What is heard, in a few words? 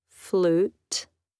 flute